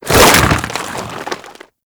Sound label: Infested Ancient Arm Attack